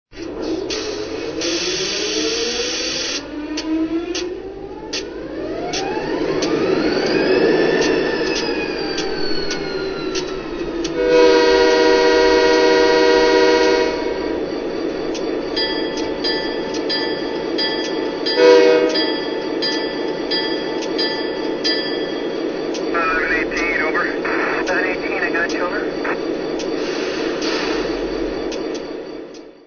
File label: SD40-2